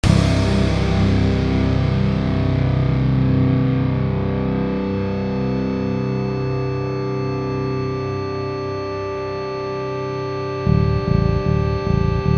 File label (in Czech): pisnicka